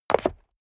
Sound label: step leather shoes concrete jump; schritte